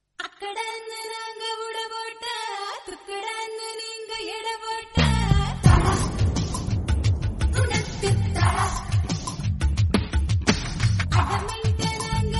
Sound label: Tamil Song Ringtone; Indian